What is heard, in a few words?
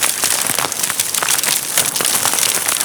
Frozen In Place, Ice; Warframe; Grineer Hammer Boss